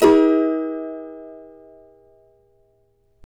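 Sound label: F major, Guitars; Spectrasonics; Hans Zimmer Guitars VOL-2; CAVA CHORDS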